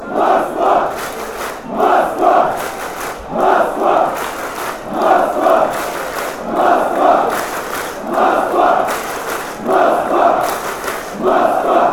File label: clap clap clap; moscow